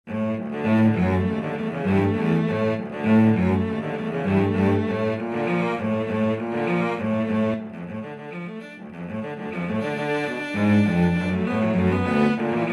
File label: cello duet